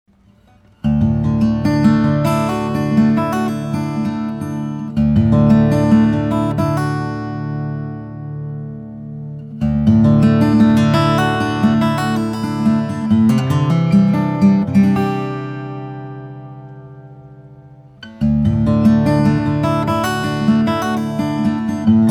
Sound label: Guitar; Rosewoods